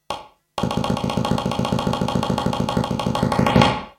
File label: filter